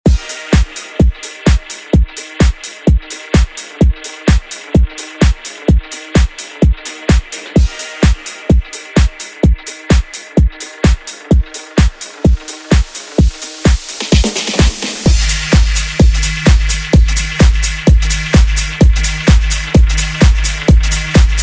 Dance Redrum

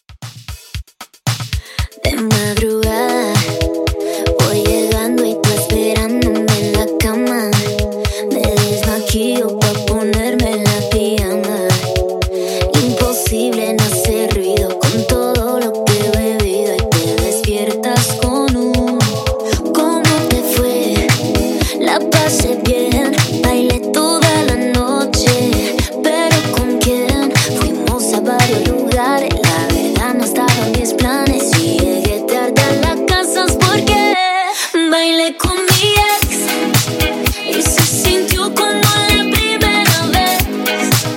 latin redrum 115 bpm

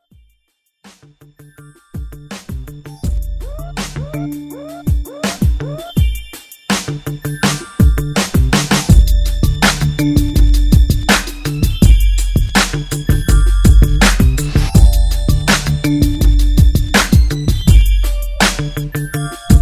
Throwback Redrum

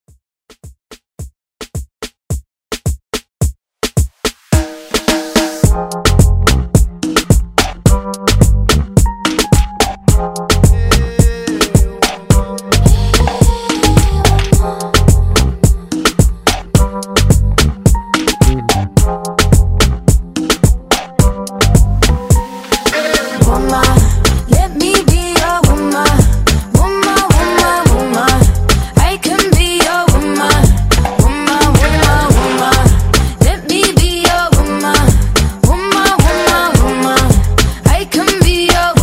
Moombah Redrum

